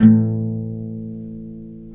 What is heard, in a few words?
ziljin, Notes, Guitar